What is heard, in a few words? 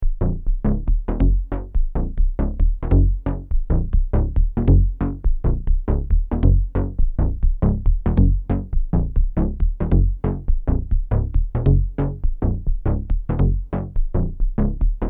Bass; LAMM RawArtistic PhilKullmann